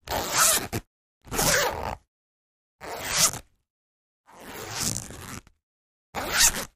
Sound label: Zipper Thin Med Moves X; zippers